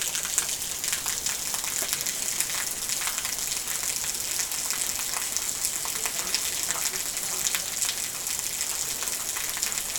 Hard Rain Gutter

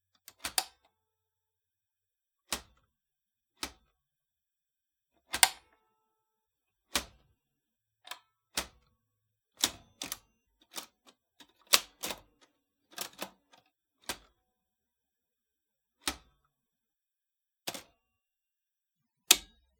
Single Typewriter Hits

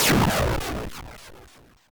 Explosion 8 Bit

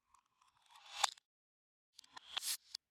Futuristic Rewind Sound

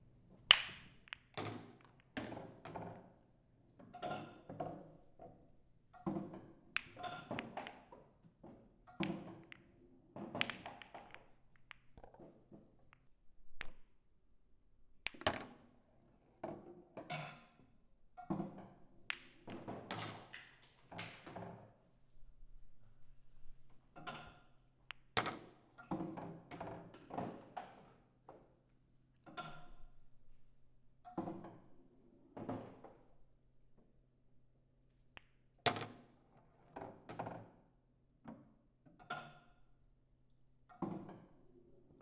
Billiards

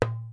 Djembe Hit Center

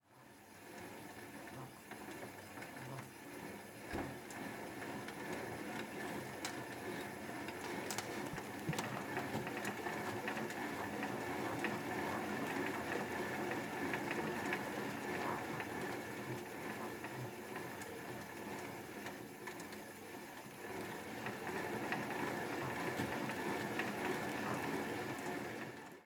Stationary Bicycle

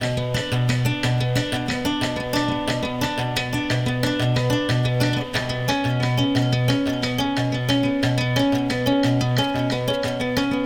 90 Bpm Nylon

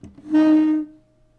Chair scrape

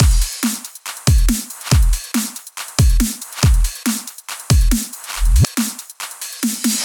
Break Beat Drum Loop 140 Bpm

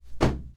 Dry Shock

